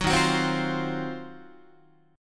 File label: D sharp Minor 6th Add 9th D sharp Guitar, chord; D#m6add9 strummed; 9,11,9,10,9,11; 4A,4E,5A,5D,5G#,6C#